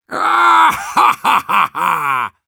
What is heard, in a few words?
Demoman laugh happy, ru